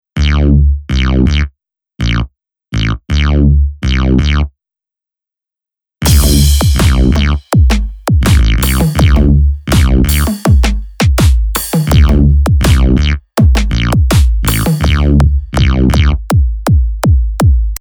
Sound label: Syntronik SVT